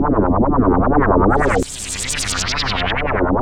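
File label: helicopter; Synth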